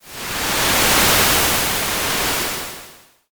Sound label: wave